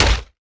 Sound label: axe mining; factorio; teflilly